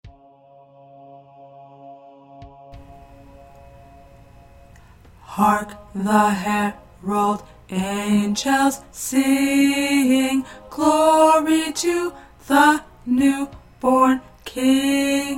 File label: Alto; Hark The Herald Angels Sing by Joubert